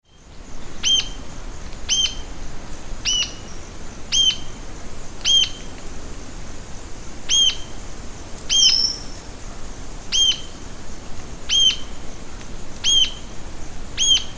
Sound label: Galbula ruficauda Ariramba de cauda ruiva